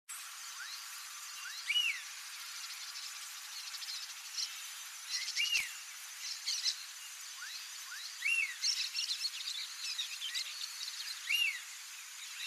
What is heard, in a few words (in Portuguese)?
Canto do guaracava grande